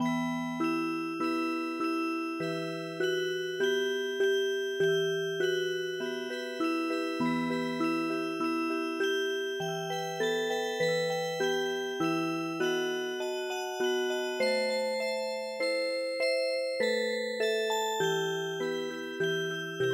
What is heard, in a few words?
Music Box